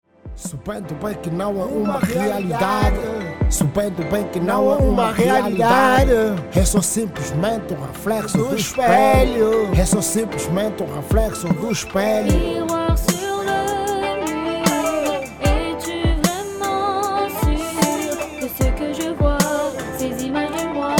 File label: rap